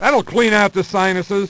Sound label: sinus; hl2dm